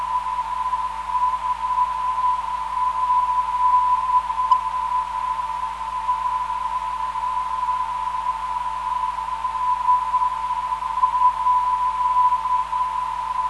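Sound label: non-directional beacon; SEP; 357kHz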